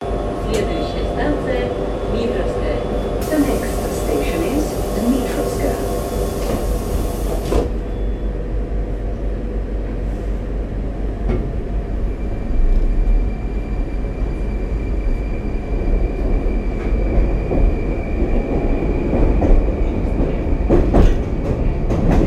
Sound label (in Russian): Метро без Шумопод; Sony; MosMetro